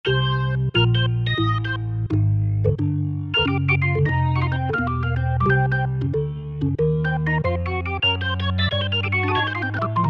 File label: Perc Organ